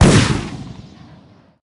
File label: pistol fire; lancer weapon fx